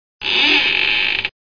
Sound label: door creak